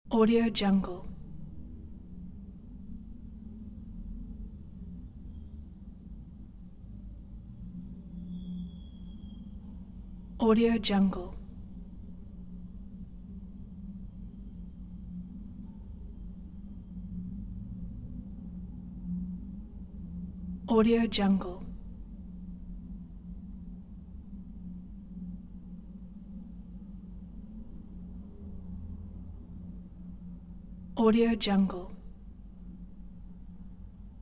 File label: ambience room